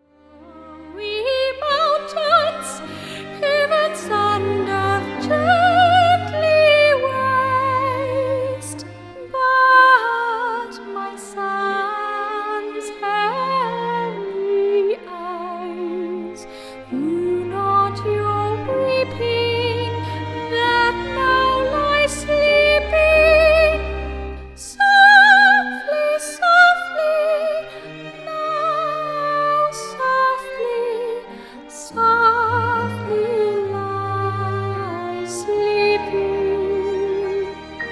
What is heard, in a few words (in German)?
Gesang; weeps you no more sad fountains